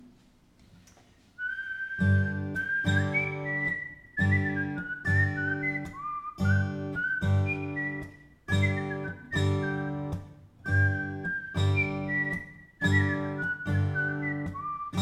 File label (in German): nikolauslied ohne gesang mit intro